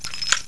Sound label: mg42 ammo